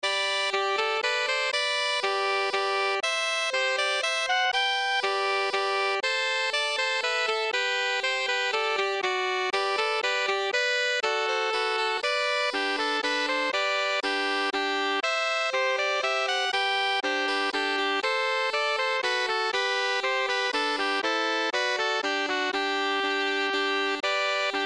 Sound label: Minuet in G Sax Trio; J. S. Bach